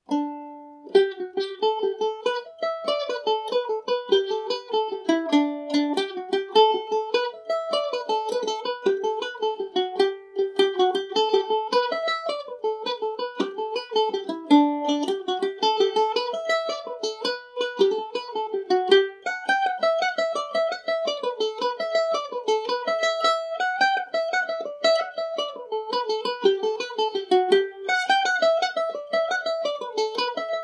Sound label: G major, irish mandolin; donnybrook fair revisited